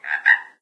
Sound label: frog, frog groups; ambient; random animals; Left 4 Dead 2; Source Engine